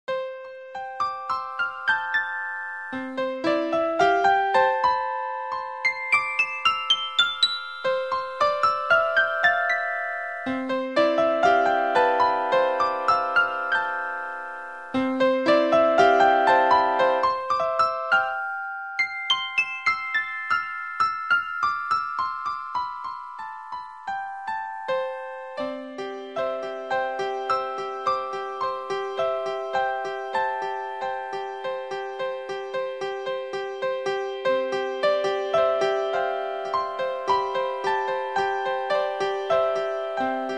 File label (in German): Klaviermusik, Harmonische Klänge auf dem Klavier